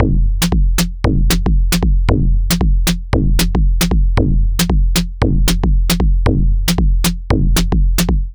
Digital Breaks, 115 BPM; Loopmasters; Jon Carter & Alex Blanco; Block Party Essentials

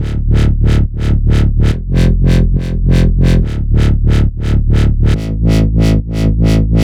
140 D BASSLINE, Dubstep; Loopmasters; The New Sound Of Dubstep; BASS LOOPS

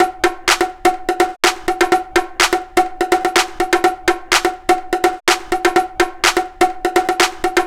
125 BPM, Digital Breaks; MPC54%; Loopmasters; Jon Carter & Alex Blanco; Block Party Essentials

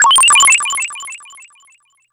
Flute Filler; Fx; Loopmasters; Jon Carter & Alex Blanco; Block Party Essentials

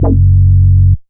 Bass Shot F#

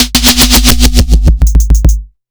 Slap Dat; Fx; Loopmasters; Jon Carter & Alex Blanco; Block Party Essentials

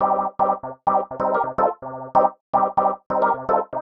126 C MUSIC; funky; Loopmasters; Funky Underground Vol 2

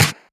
Claps & Snares

